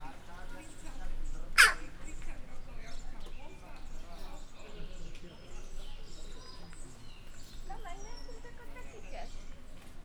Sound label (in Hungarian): végre megszólal, csóka; állathangok; miskolci zoo 2018